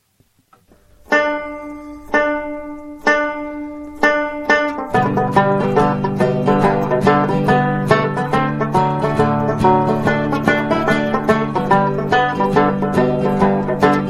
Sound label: hornpipe; high level